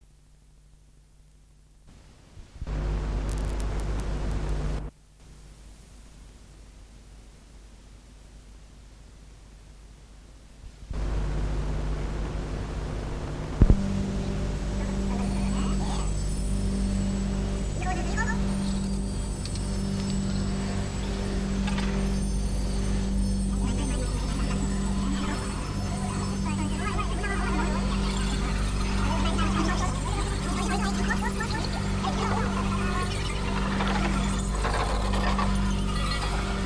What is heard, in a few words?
white noise